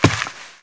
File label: rock climb, step